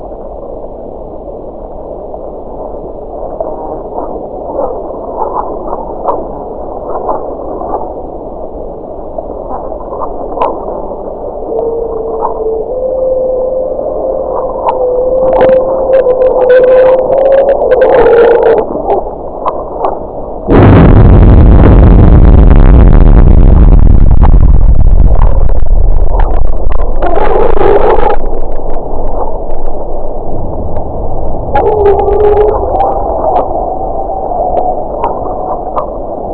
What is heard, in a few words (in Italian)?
Grecia, Sonificazioni Eventi Sismici; Grecia Magnitudo MW 6.3 Isole Ionie 26/01/2014